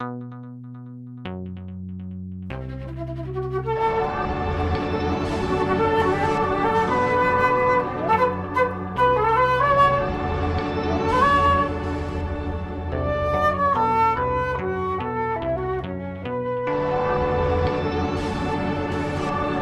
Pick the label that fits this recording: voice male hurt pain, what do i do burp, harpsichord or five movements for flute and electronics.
five movements for flute and electronics